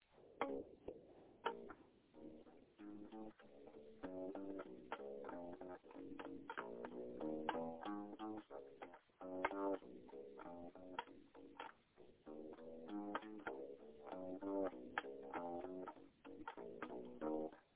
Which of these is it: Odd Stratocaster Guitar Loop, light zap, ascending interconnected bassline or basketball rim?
ascending interconnected bassline